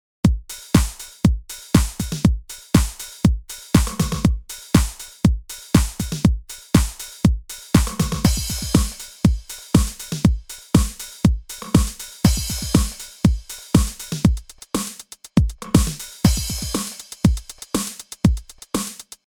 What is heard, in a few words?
Trance; Trance Practical